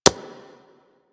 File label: Infested Minion Step Default; Warframe